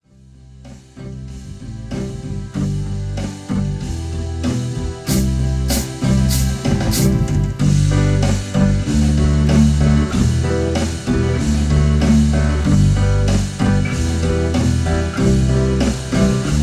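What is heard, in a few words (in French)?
canette trop chouette, Version instrumentale sans ligne mélodique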